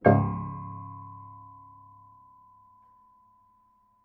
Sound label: Harm Low, Piano Harmonics